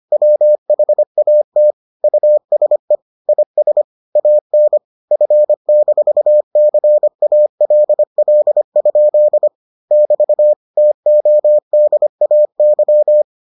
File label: morse